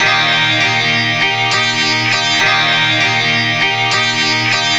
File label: Cowpoke Guitar, Strings; Sonic Foundry (Sony Creative Software); Myths of Technology